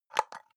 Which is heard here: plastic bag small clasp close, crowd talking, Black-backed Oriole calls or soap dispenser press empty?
soap dispenser press empty